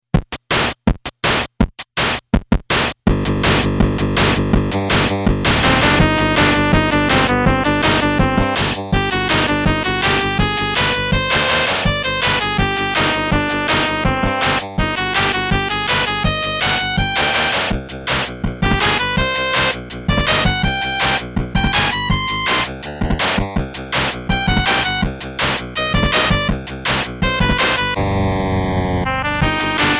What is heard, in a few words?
Egypt Guitar; bill teds excellent adventure gamerip 1990